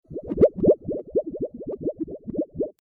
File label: Under Water Sound; FXHome